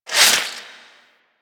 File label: Crew Ship Pilot Finisher Stab Out; Warframe; Rail Jack; Grineer Crew Ship